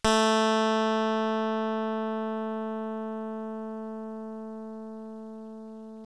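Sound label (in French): clavecin, harpsichord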